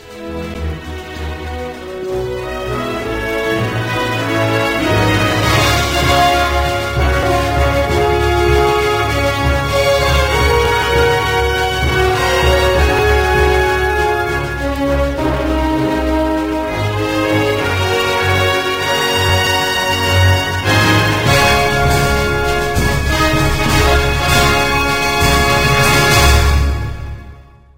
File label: end music